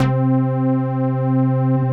PUNCH BASS; BASS SOUNDS

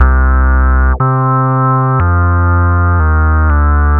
Monster Bass, Bass Foundations; Sonic Foundry (Sony Creative Software); The Electronic Music Manuscript Collection

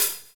Open Hhat, Hihat; Equipped Music; Loungin House

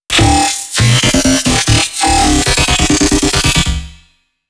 C loop, bass

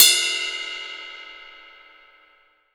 20INCH ZIL RIDE, Ride Cymbal

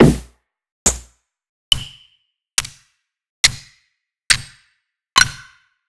Percs; monosounds

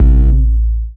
DM BAS, Dance Mega Synth; Best Service

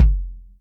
Maple, Yamaha DTXpress Acoustic Kick

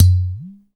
UDU DRUM

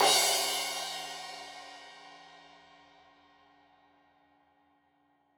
Crash 15in L edge, Cymbals Stereo

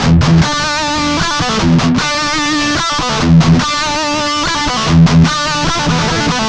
Guitar Licks

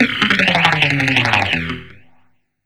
FX GTR, GUITARS KIT

